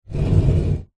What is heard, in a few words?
household cupboard door wooden slide low frequency; zapsplat